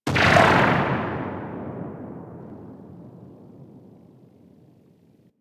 Anti Aircraft Gun Tail D; Weapons; Warframe; Archwing

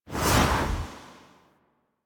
Requiem Mod Open; Warframe; Kuva Lich

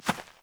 Fawn Step; Encounters; Animal Rescue

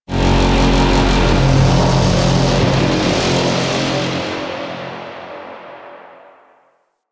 Machine Activate Rattle; Warframe; Ambience; Venus Open World; Objective Rooms; Venus Obj Deck Twelve

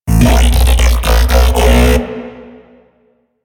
Mech Ambient Vocal

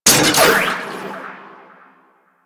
Harpoon Gun Charged Mech; Warframe; Sharkwing; Grineer

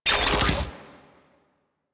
Charge Up, Spear Gun; BE Ferrox

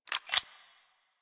Pistol Reload A; Bard

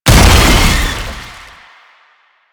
Technocyte Explosion; Enemies; Lasrian Tank; Warframe

